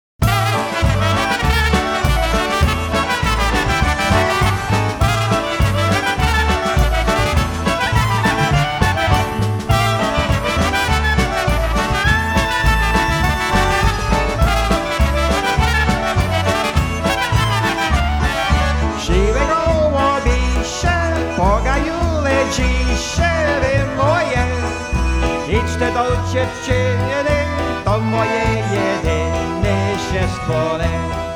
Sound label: Grey Pigeon Polka